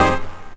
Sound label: BRASS, Drum & Bass, 160 BPM; Ueberschall; Drum & Bass Resonance